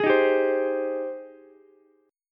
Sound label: Ab Major 7th Ab, Ukulele; AbM7; strummed; chordshape; 13,15,15,15; JGuitar